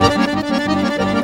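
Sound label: accordion groove; Best Service; World Colours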